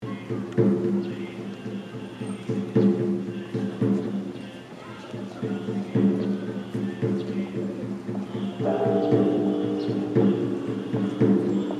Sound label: Sounds of Osaka Temple